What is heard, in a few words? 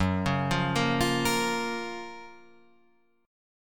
Gb Major 7th, down; GbM7 arpeggiated; JGuitar